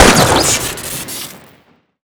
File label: sniper rifle classic shoot crit, weapons; tf2classic